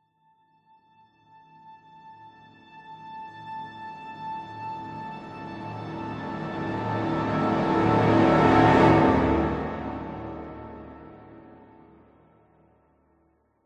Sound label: sound design cinematic orchestral music riser dramatic horns strings; zapsplat